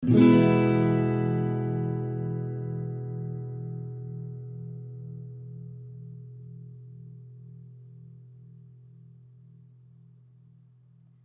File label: Neutral Stinger